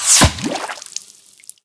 bullet hit water

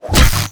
baton hit cable

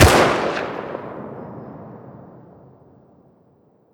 sar attack; Rust

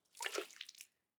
waterbucket throw; Rust

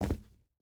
footstep hide concrete jump land; Rust

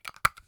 mayo close; Escape from Tarkov

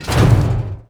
impact sheet metal light

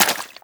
foot mud, footsteps; Call of Duty Modern Warfare

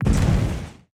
damage hits explosions, Warships

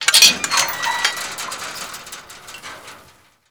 door gate chainlink slow open; Doors; ModernWarfare

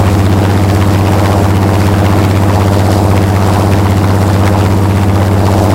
griffon rear, engines; War Thunder

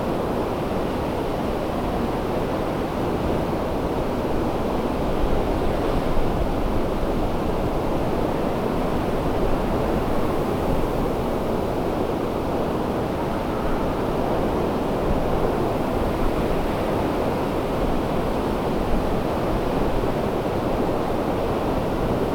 Ambient Wind; DCS

